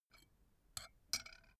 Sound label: put tea spoon in cup unsteady; berufe; gastronomie; soundpool